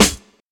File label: focused snare sound C sharp key